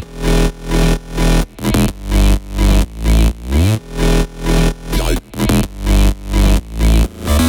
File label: 128BPM Melody Root E; Zoo; Vengeance Samples; Zoo Kit